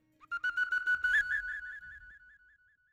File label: Birds, Hip Hop Exotica, Apple Loops; Big Fish Audio